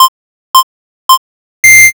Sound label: countdown